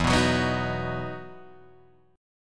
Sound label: E Major 7th Suspended 2nd E down Guitar Drop D